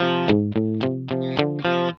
ROCK F 110, Vintage Blues Guitar; USB Soundscan vol.22; AKAI; ROCK2

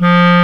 CLARINET F2, BASS CLA

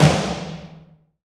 TOM, Dance

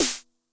SNARE DRUM High Tune Med Tone, 909; classic machines; 909mod

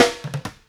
Street Groove, Ambient Grooves

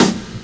SD, snare, 70 s Breakbeats

